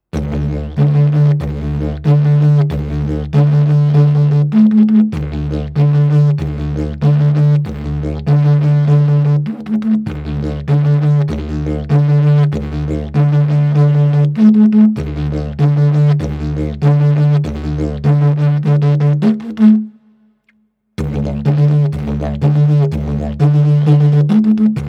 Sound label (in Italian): ritmo livello avanzato